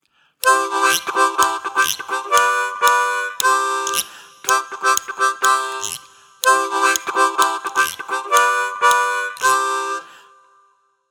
duo harmonica et guiro; loto sonore

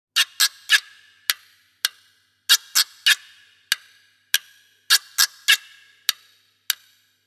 impro guiro; loto sonore

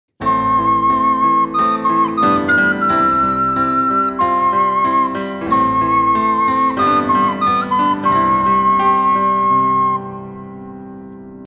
duo piano et flute a bec; loto sonore

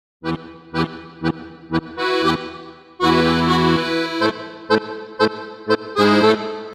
impro accordeon; loto sonore

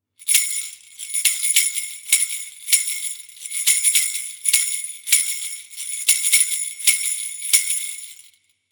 impro grelots; loto sonore

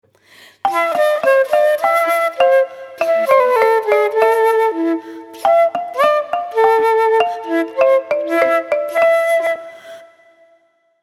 duo flute traversiere et xylophone; loto sonore

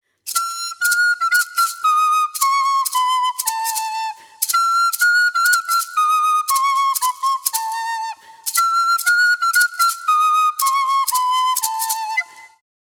duo flute a bec et maracas; loto sonore

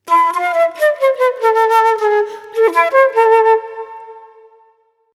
impro flute traversiere; pour les petits; loto sonore

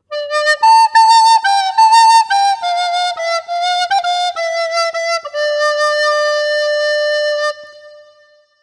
impro flute a bec; loto sonore

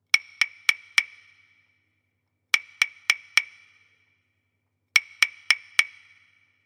impros claves; loto sonore

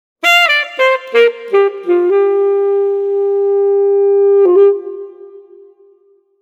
impro saxophone; loto sonore

